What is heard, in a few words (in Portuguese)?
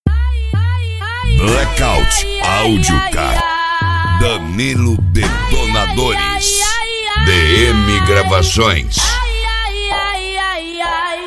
eletro funk